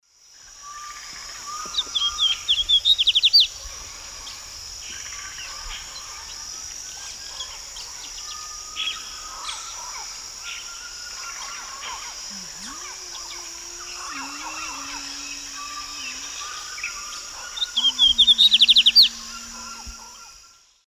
Sporophila lineola, song male; S. America; Eastern Lowlands

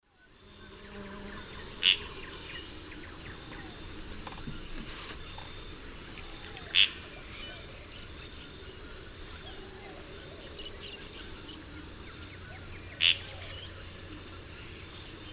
Tityra cayana, call; S. America; Eastern Lowlands

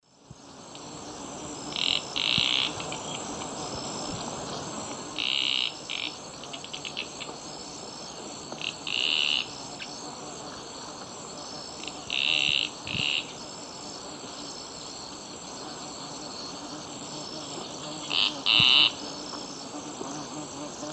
Synallaxis propinqua, call; S. America; Eastern Lowlands

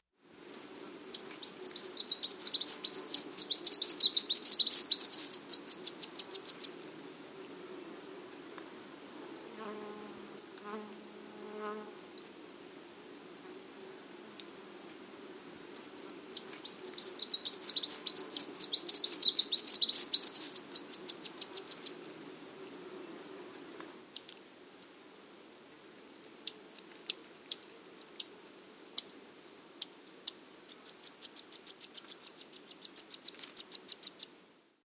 Song duet from pair, calls and chip series, Birds

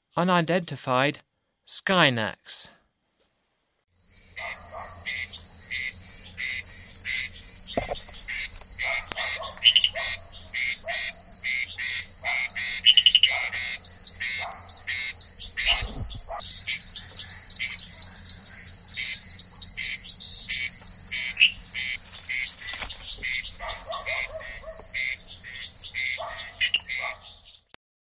Scinax, Frogs of Ecuadorian Amazon; S. America

